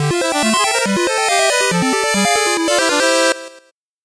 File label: 8bit jingle fast